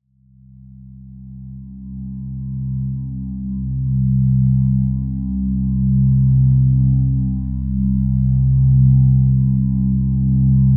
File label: deep neutral drone